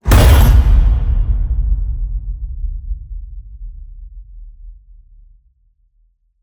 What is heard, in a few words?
HIT Metal Crash, Cinematic Hits Designed